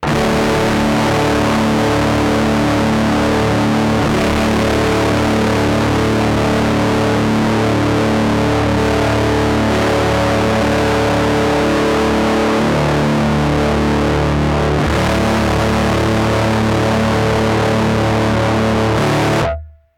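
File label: Rat guitar, Moogerphaser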